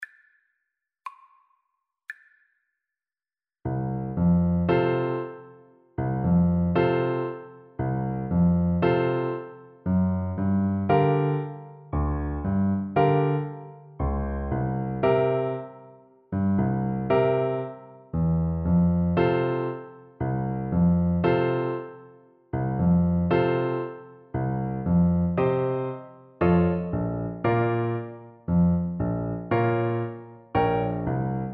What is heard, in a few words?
Alto Recorder